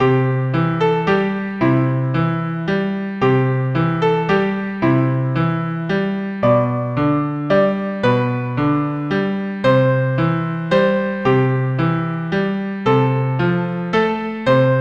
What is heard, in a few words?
piano; Silent Night